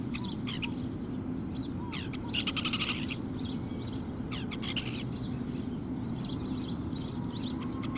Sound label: Nile bird; Splashdown; XBOX; Soultree